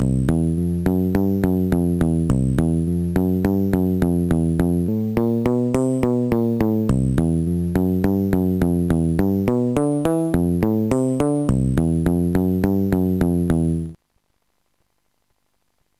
blues bass; Sonix Music Driver

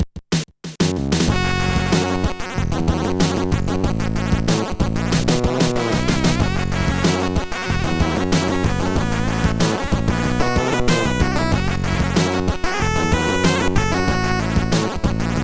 mod synth

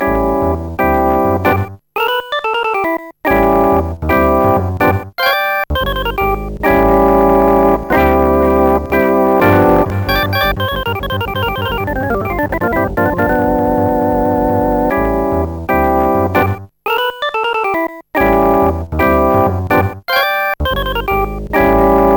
jazz orgie

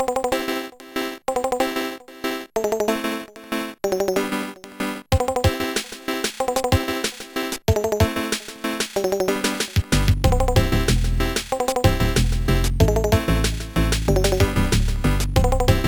small time reggae, chiptune

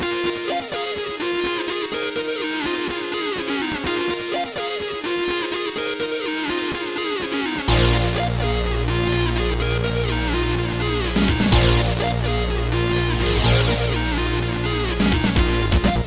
digital noises; Trackers; Modland; Reflect

